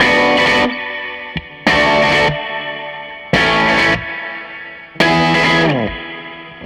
SG Guitar Loop

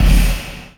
Jungle Kick; Sonic Foundry (Sony Creative Software); Esoterik Beatz; Jungle Kit

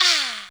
Mounth Perc, Korg Wavestation

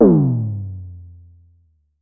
Drum X Tom, Pearl Drum-X

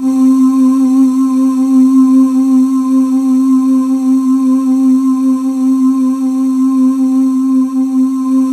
C4, Synth Leads; 90 s Sample CDs; NiceBeats (NiceBytes); Studioline 09

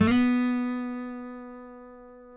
B, guitars; Spectrasonics; Hans Zimmer Guitars VOL-2; FNGSTYLE PFM

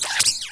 scifi, SCIFI & SWEEPS; Techno Trance Essentials

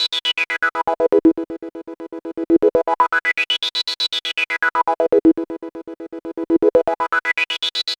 Synth

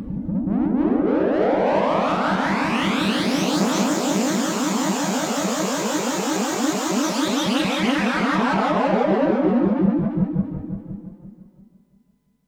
Jump Drive, sci-fi

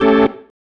Stab, Organ, One Shots; Sonic Foundry (Sony Creative Software); Joe Vitale Organ Donor

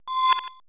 PERC MIX

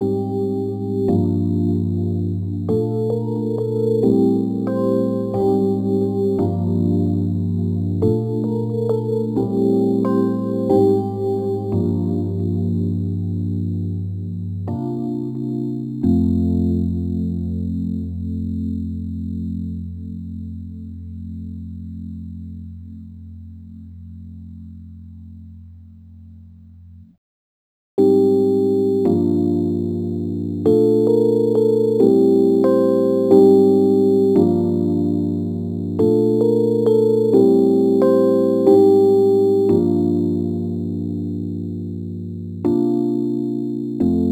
Rhodes Attacks; Ueberschall

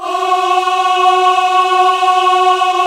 AAH; AMG - Vince Clarke Lucky Bastard

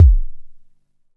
Kick, Drum & Bass; Kicks; AMG - Prototype Drum & Bass

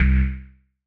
Har Bass C1; 140bpm; Zero-G Sound Sense Grime Scene; GS Construction Kits; Astoria; 90 s Sample CDs

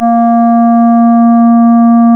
Synclav FM, WIND, A#; Big Fish Audio

